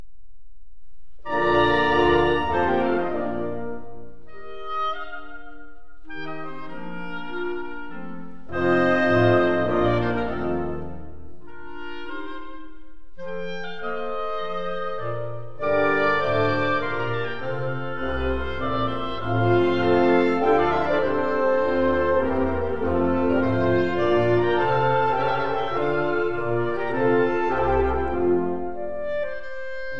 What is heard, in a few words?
Serenade in B flat, Wind Serenades; Strauss & Mozart; K. 361; 370a Gran Partita - Mvt. 2. Menuetto; Trio I; Trio II